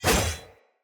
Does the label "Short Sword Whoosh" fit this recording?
Yes